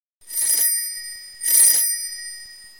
Classic Bell Ringtone; Huawei Mate 40 Pro

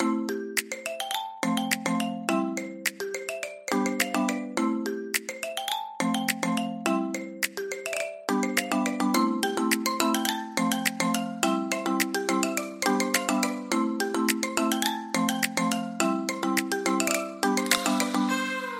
Huawei Mate 20 Ringtone

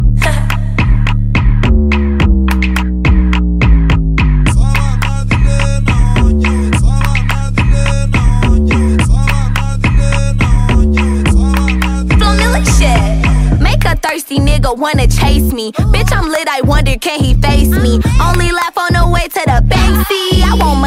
English Ringtone

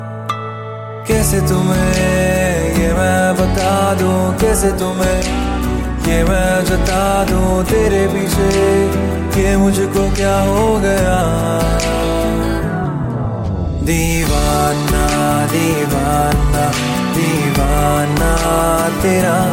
Punjabi Love Song

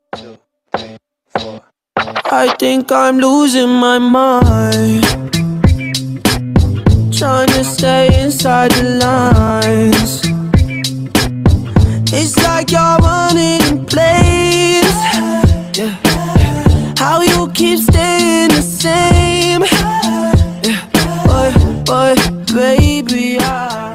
English Ringtone

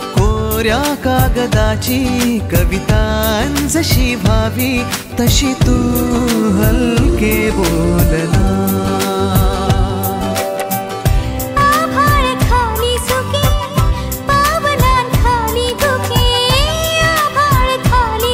Marathi Love Song

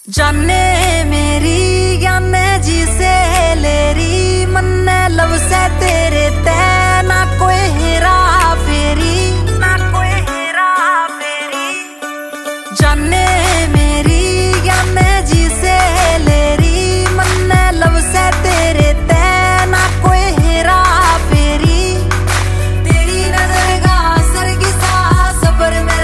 Haryanvi Bollywood

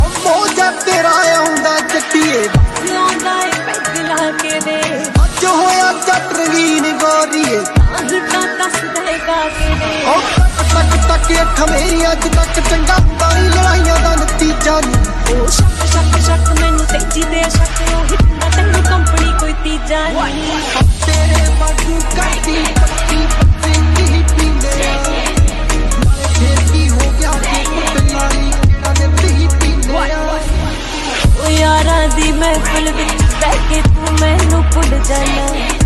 Punjabi Cool Song Ringtone

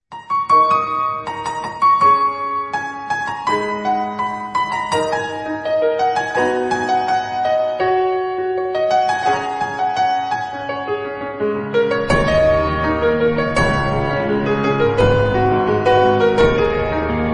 Piano Tone

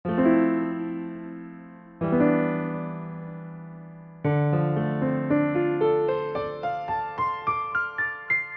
Trendy Samsung Ringtone

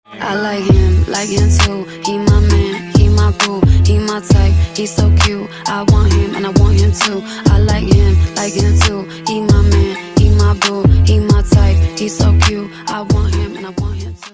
Ringtone English

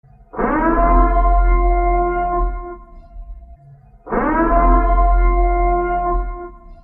Siren Samsung Ringtone; The Purge

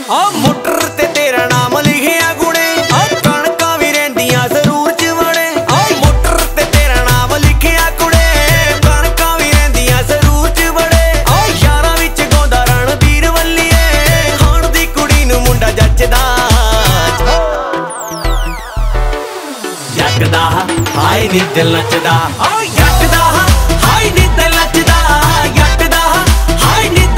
Punjabi song

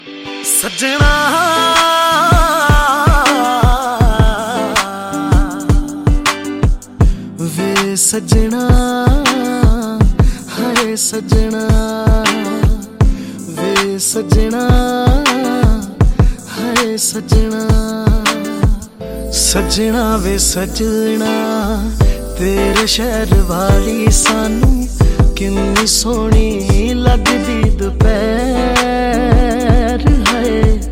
Sad Punjabi Ringtone

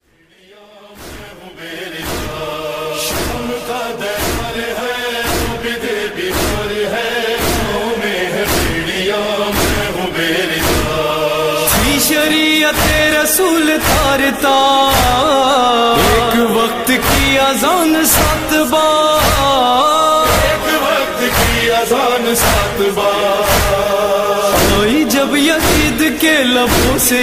Noha Ringtone

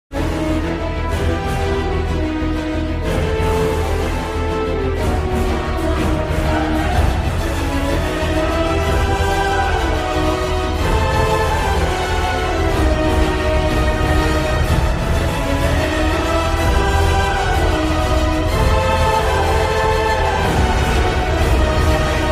BGM Ringtone; Moon Knight

